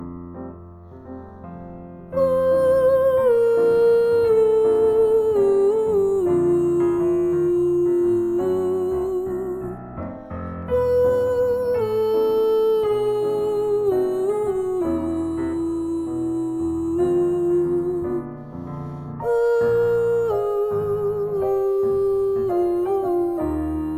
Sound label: Warm up, ionian mode